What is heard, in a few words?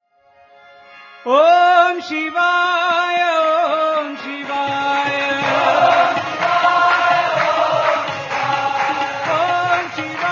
AKHANDA BHAJAN; SHIVA; OM SHIVAYA